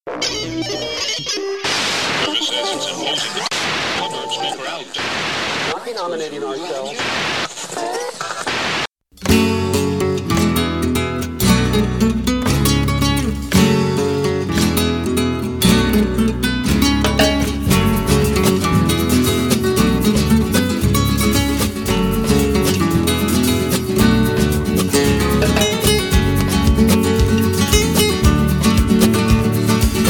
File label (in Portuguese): TRECHO FLAMENCO; PGTIAE 2011 - Ritmos Musicais